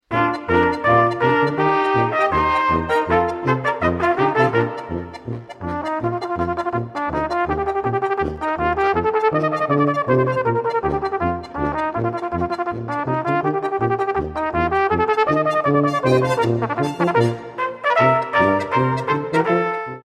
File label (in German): fluegelhorn